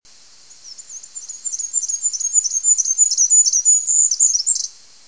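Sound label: goldcrest voice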